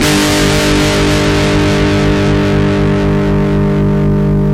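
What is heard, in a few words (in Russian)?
гитара с дисторшеном шумный звонкий резкий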